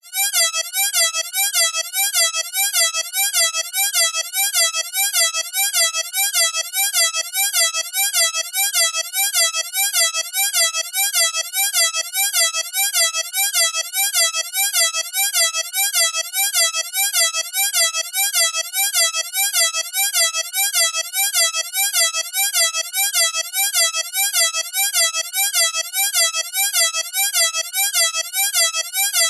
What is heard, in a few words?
emergency alarm siren warning wailing digital slow